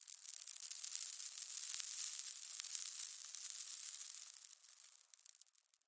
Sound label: Dust Debris, rocks & dirt